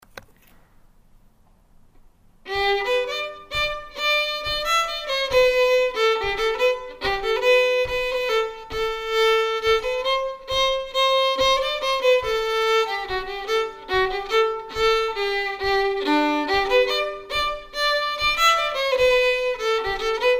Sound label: Redowa Polka, fiddle